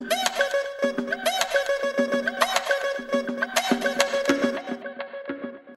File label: Conga Text Alert